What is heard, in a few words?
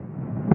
One Shot, Hits